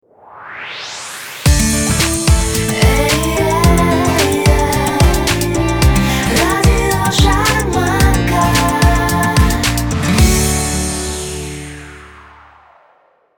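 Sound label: barrel organ; Sharmanka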